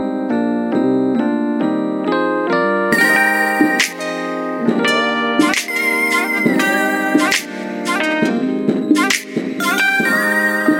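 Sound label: instrumental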